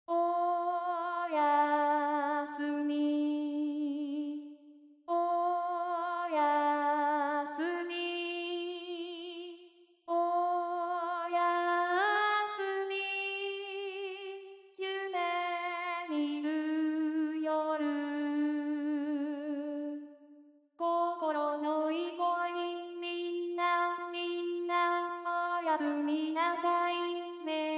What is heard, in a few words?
alt, chor